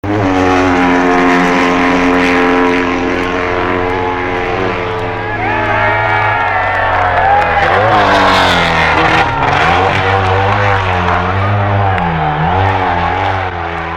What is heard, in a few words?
BSA Outfit; 1962